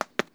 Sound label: footsteps